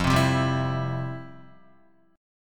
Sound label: F Major 7th Suspended 2nd, Guitar; strummed; 4F,4A,5C,5G,5C,6E; FM7sus2; 0,3,0,0,0,0; JGuitar